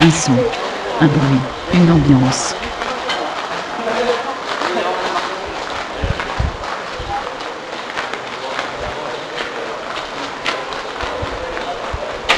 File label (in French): Un son un bruit une ambiance